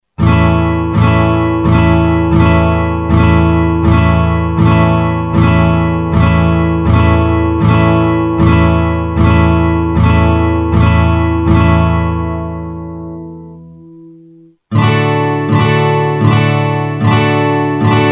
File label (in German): A Akkordfolge